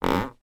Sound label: foley footsteps creaky attic floor